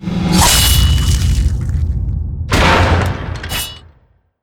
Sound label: Assassination Dual, Finishers; Skana